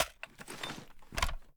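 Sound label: sniper reload empty end; gmod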